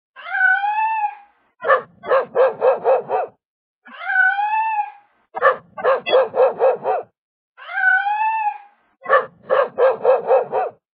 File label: Cat and dog talking